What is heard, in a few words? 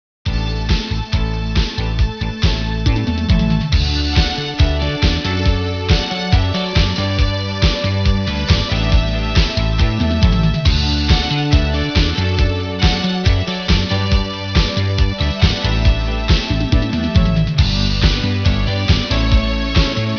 eighties Jam